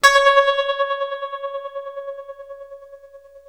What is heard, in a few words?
TREM C#4, Guitar Samples, TREMELO; East West; Steve Stevens Guitar Samples Collection